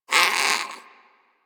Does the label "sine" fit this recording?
No